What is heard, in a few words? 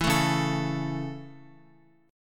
Db Minor Sharp 5th Guitar; strummed; x,x,2,2,1,4; 4E,4A,5D,5G,5C,6F